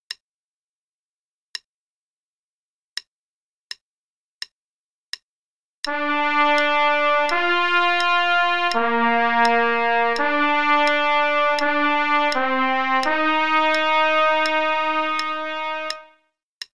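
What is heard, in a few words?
Play-a-long, quarter note = 84, trumpet; SOE Bk 1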